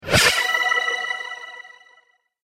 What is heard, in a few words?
Wizard Torch Spell, magic sound effects